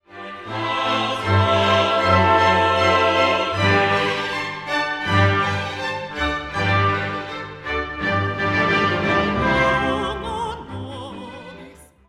Dona nobis pacem blurred rhythm

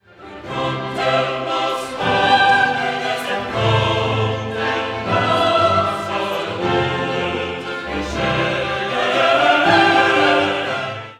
Credo Qui propter

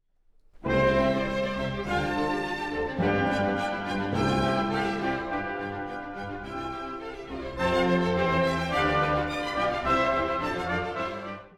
Orchestra; KV262 Kyrie

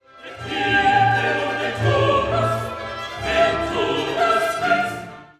Credo Et iterum

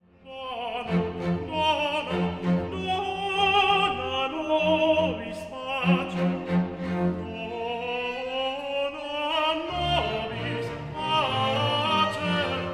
Agnus Dei Tenor slows

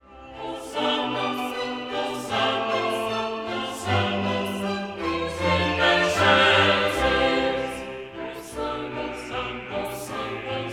Basses hosanna

